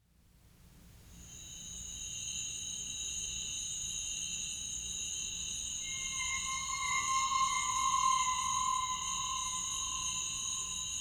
percussion

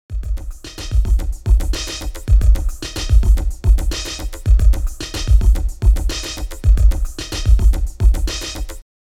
bass beat

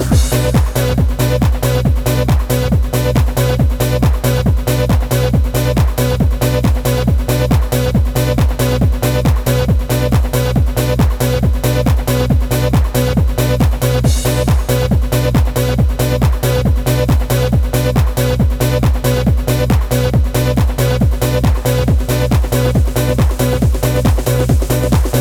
instrumental mix

